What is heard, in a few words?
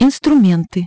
menu; Hyundai H-MP718